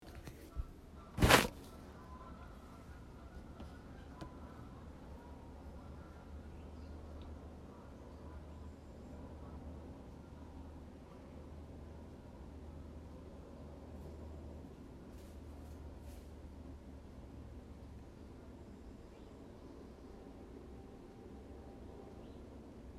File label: distant geese